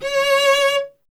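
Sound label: strings cello C#; Miroslav Vitous; Solo Instruments VOL-1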